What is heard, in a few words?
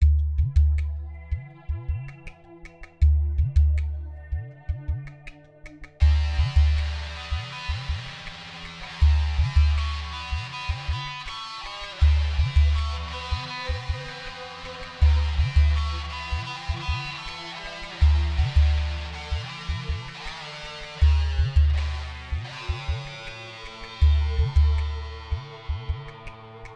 Guitar Intro